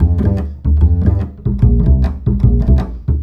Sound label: Acoustic Bass